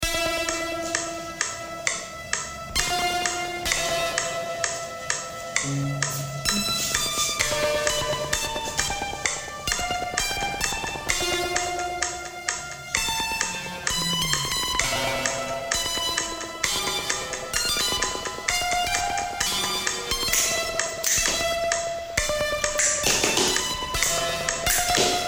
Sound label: metronome